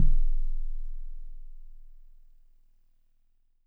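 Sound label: Warble Celeste DX100 D#0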